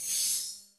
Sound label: dissolve; transition; huashu design; sfx